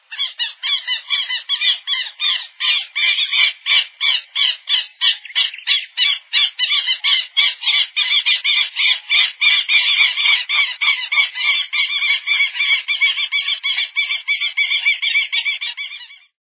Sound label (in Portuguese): quero-quero